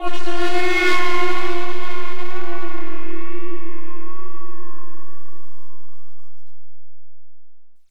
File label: keys stinger; Loops; Sad